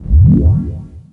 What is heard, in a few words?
Pickup Scifi Energy; SFX Kit